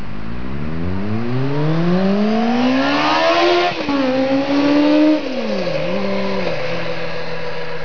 accelerate, biker

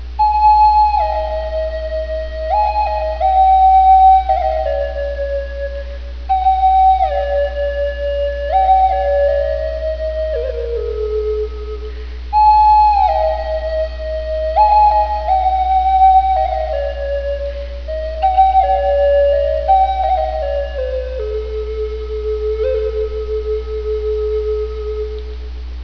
pipes

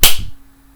Slap; baldis basics

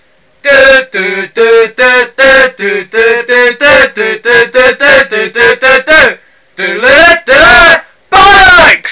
ice hockey

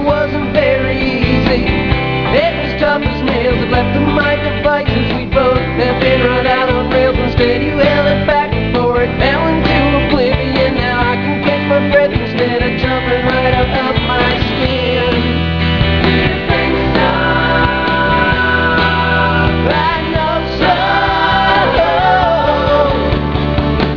wedding song

